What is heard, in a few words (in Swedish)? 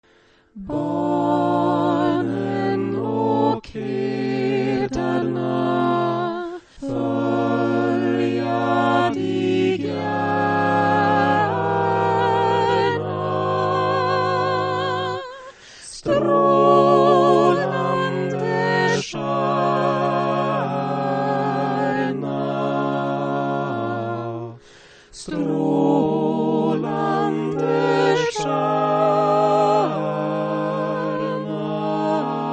sopran